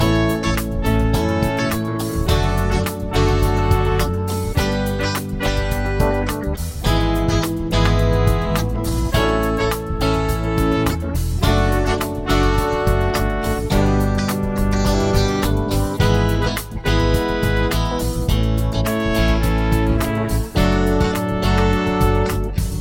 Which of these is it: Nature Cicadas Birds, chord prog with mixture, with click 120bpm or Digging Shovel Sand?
chord prog with mixture